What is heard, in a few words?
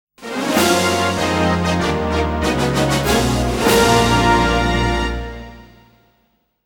Start Fanfare